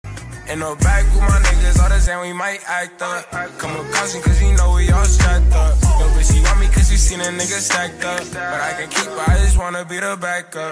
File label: low pitch